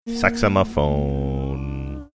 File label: saxamaphone